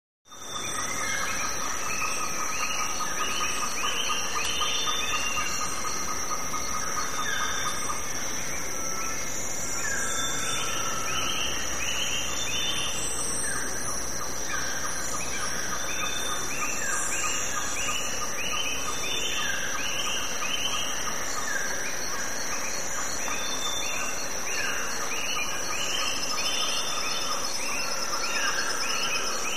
Jungle South America, rainforest; Jungle Atmosphere; South American Rain Forest Atmosphere. Lazy Mixed Bird Calls With General Background Ambiance.

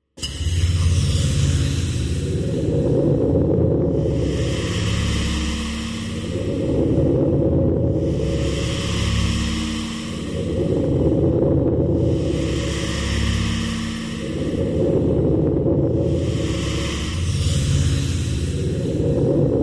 Big Lungs Slow Breathy Shimmer Ambient

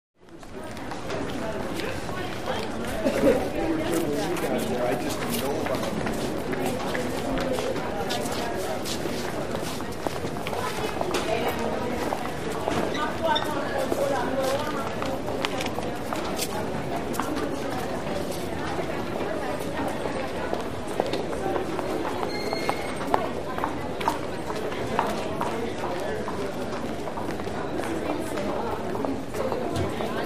Lg Crowd Ext Footstps, lobbys

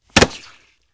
Football Grab Heavy, football

